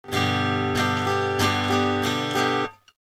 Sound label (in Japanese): 基本パターン, guitar